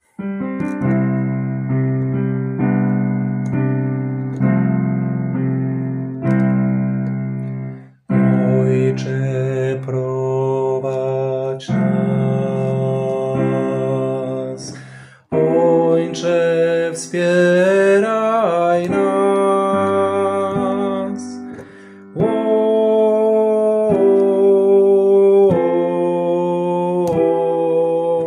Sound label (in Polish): bass; Ojcze prowadz╠u nas; nagrania glosow